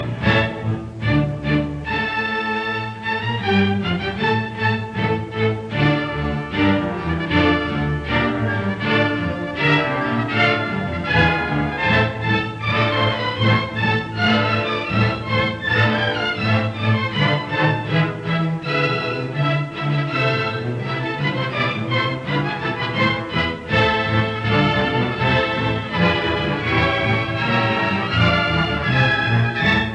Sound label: Allegro non troppo; Lalo; Symphonie espagnole; Op 21